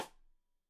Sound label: Side Stick Stereo; Deeply Sampled Drums